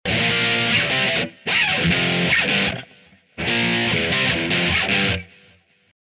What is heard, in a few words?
Bridge Speed Rock G